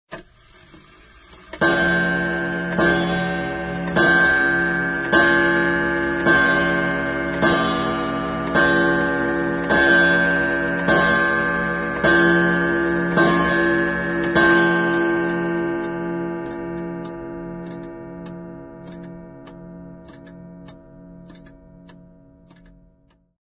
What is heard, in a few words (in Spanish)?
12 campanadas con un reloj de pendulo; Tono para teléfono móvil, muy apropiado para la nochevieja; tono de llamada